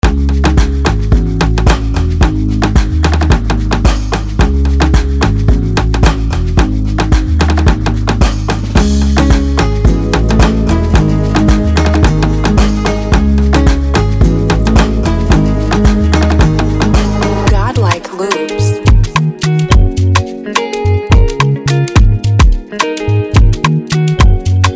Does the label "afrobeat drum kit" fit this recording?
Yes